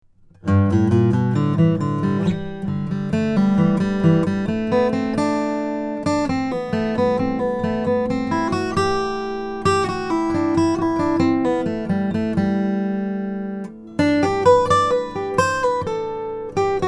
branzell dread bees wing mahogany branzell

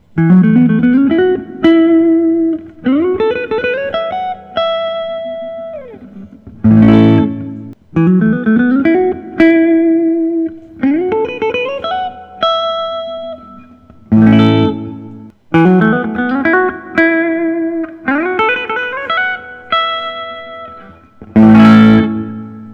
mckay t35 tobacco burst

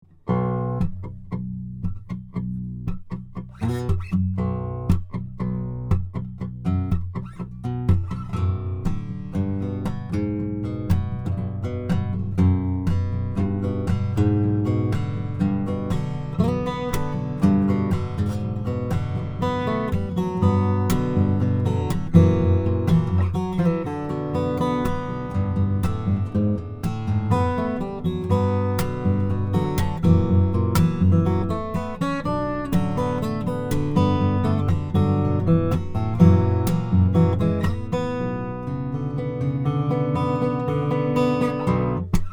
zimnicki baritone cocobolo

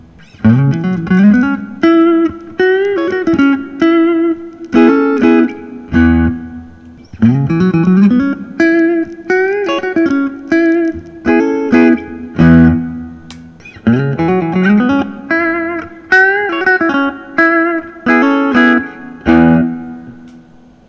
vinetto vince cunet sc 63; artifact daphne

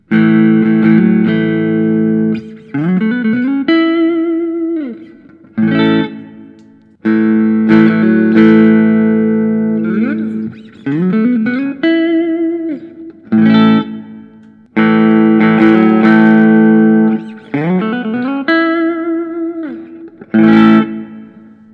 nik huber orca 59 superhollow

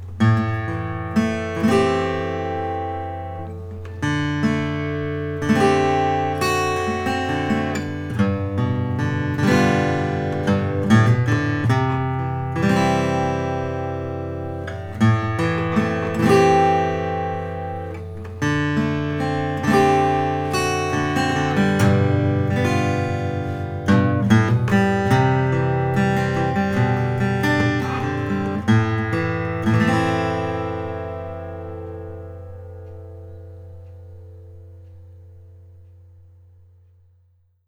collings 01 12 fret; natural

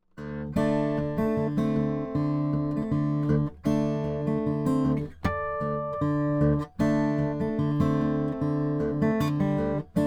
Froggy Bottom G Brazilian rosewood

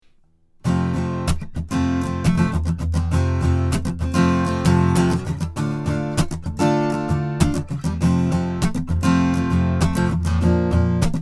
rainsong jm1100n2 carbon fiber

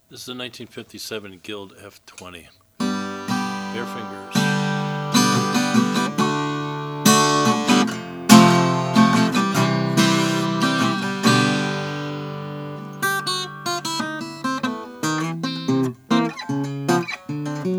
guild troubadour f 20 natural

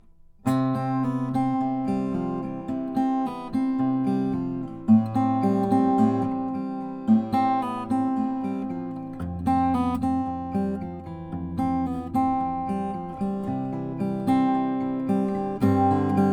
s01 walnut

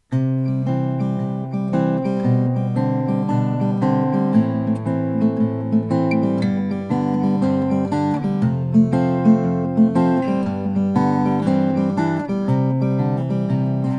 gibson hg 00 sunburst

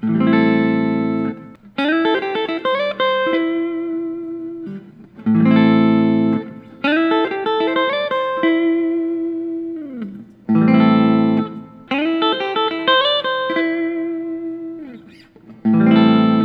gibson memphis es 335 fat neck; 2008

